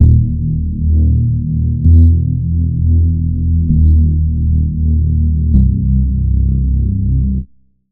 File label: Reese; Routed Sound; Spire Expansion - Noble Gangster Vol.1